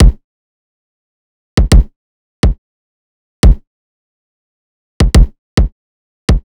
Riddim Drum Loop Kick (140)

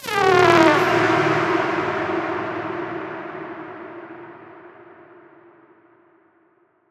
Atonal Depths, Psytrance; Ghosthack; Psytrance Visions Volume 2

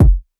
Kick - C; Drum One Shots; Cymatics; Cobra Hip Hop Sample Pack

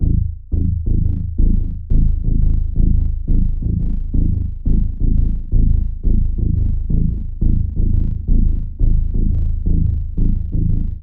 Bassline - Am 87Bpm; Construction Kits; STEMS; Ghosthack; SkyRealm; Kit 18 - Shivering Sea

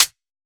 Close Hi Hat, Dubstep Cymbals; One-Shots; Drums; Lenticular; Ghosthack; Ghosthack - AC2024 - Day 13 - Dubstep Sounds 2025

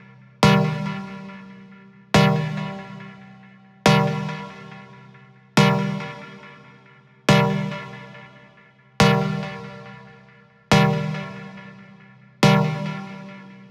Lead C 140BPM, Techno; Rave; Melody Kits; Stems; Ghosthack; 7 Free Rave Melody Kits 2025; Kit Reasons

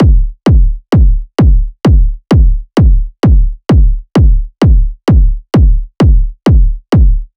Kick Loop 130, Techno

